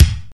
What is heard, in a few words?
80s Kick